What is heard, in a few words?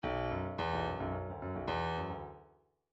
bdf midi file grooves funk s funk s